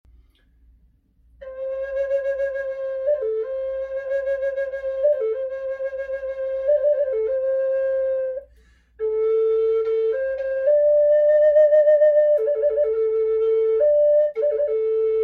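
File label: natural, wood flute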